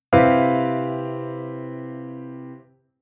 Chord Tension 11

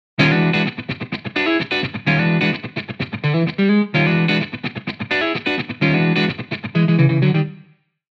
guitar pattern 2 gt

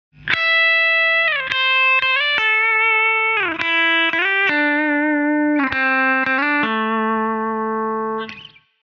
guitar Comp solo

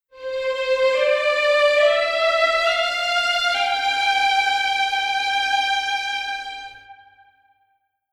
Strings Slur

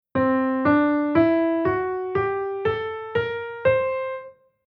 Chord mode C Lydian b7

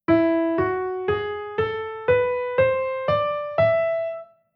Chord mode Mixolydian b13

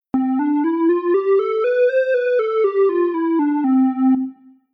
Synth Lead Soft Lead

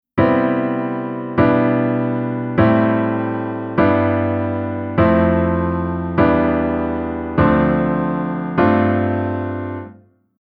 Chord Reharmo

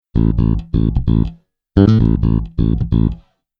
Bass

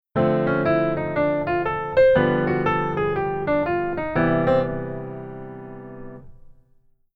keyboard voicing form

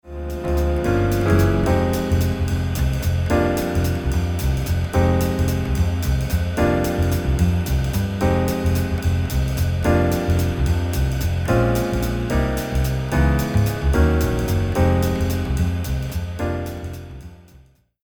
Jazz Waltz Bass Verse; Sketch

